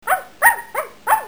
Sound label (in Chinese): 狗